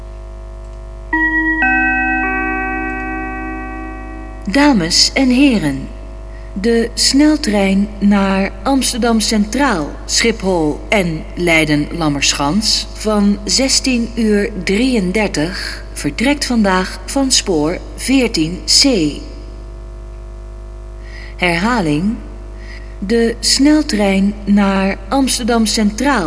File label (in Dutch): trein naar Schiphol